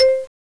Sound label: marimba; Hyper Stacks; Pacific HiTech; MandelMusic